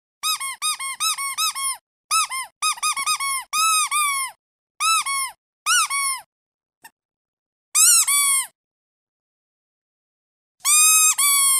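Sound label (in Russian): игрушка пищалка собак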